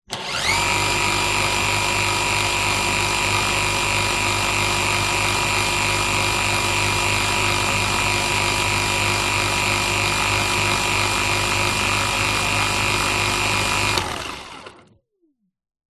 household vacuum cleaner dyson v7 stick med long burst close up rotating head